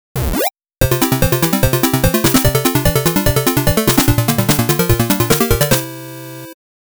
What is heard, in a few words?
8bit clear; Shmups Skill Test